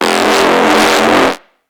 bass tweak scraper F; Polyend; ARC Noise

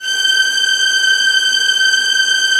VIOLINS, Synclavier Strings; ILIO

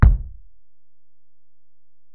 Ac BD, Alesis DM-PRO; 300 Drum Machines

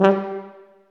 TUBA, Tuba stacc; Roland L-CDX-03 Disk 2

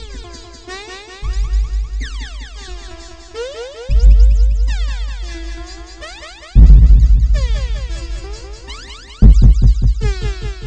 clanger history 090bpm, Downbeat Dance Grooves; Zero-G

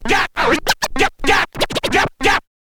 scratch kit, vinyl

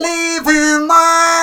LIVING ON, Funky Vocals, SHOUTS; USB Soundscan vol.18

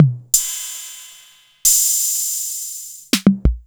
roland 606

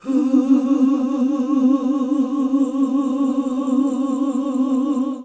OOH C3, GOSPEL; Best Service; Hallelujah; GOSPEL 10

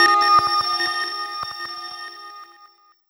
Bellish; Sonic Foundry (Sony Creative Software); Synchro Funk

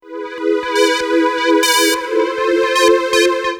2 Step 133 SYN, House

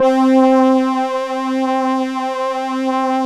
LAMBDA, String Machines

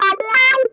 STRANGLED, Funk Guitar; Zero G - Funk Guitar

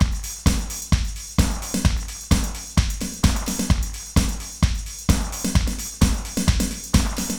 loops 130 bpm; FRY DA NIGHT; Masterbits - Tekkno Trax

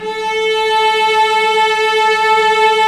STR VC3

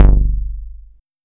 STUPID BASS, Bass Analog & Synth, DISCO; USB Soundscan vol.12; AKAI

